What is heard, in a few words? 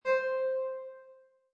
Musical, Button sound or menu sound; Multimedia